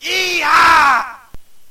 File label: yeeha